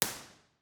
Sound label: theatre bformat IR; Individual IR Takes; OPENAIR